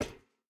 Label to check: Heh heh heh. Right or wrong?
Wrong